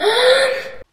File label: female orgasm